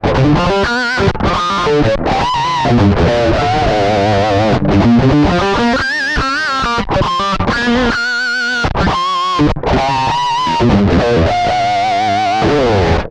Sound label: Harmonics; sansamp